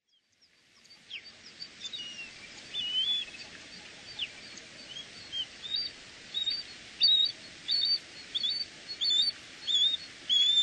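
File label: Osprey, aichi; misago; koe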